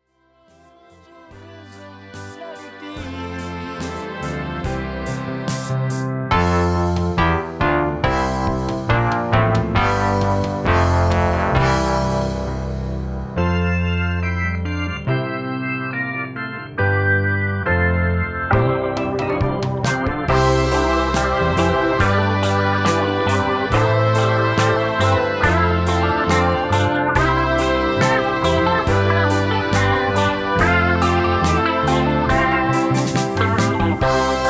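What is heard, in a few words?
ghost guitar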